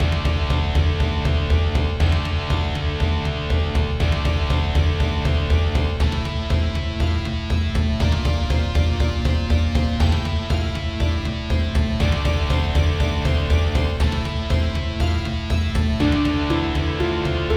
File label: Ominous; Jill of the Jungle; reworked midi soundtrack 2019